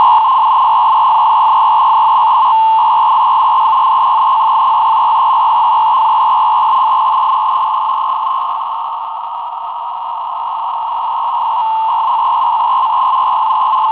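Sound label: fsk 200 baud; 6886khz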